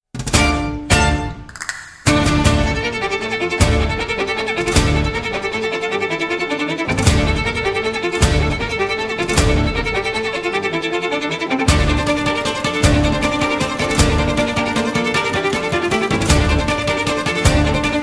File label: Asturias Acoustic Version